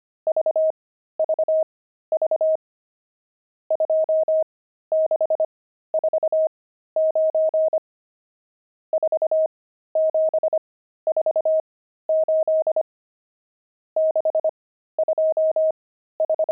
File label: pangram, intermediate pangrams files